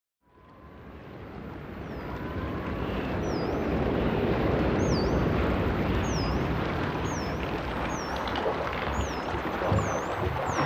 Gentle Dolphins Whales, Asian Zen Spa Music Meditation